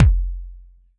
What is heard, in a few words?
Yamaha FS1r Drum Kit Kick